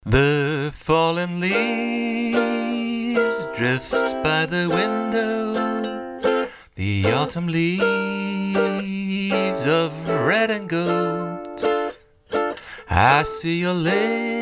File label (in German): IMG Stageline ECMS 90 gesang